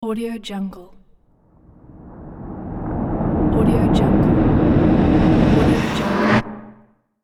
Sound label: whoosh ending